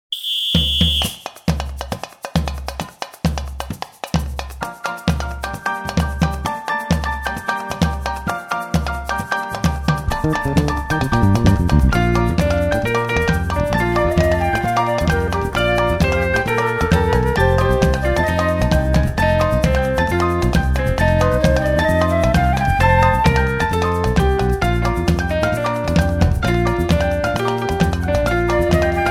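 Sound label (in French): carnaval samba instrumental; bandes orchestres cycle 3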